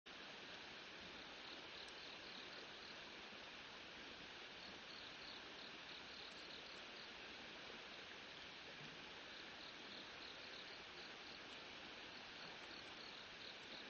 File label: riverside